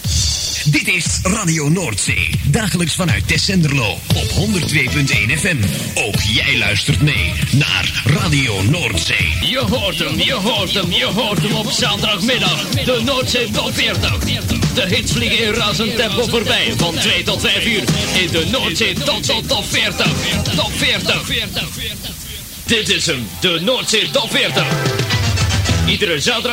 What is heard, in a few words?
jingles; NOORDZEE Tessenderlo